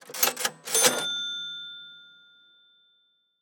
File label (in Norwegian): Kasseapparat handel